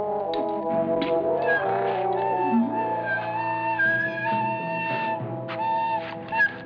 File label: flute; Flying Dutchman; Cha-kas